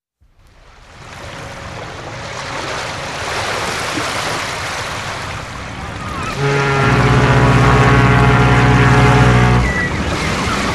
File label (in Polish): morze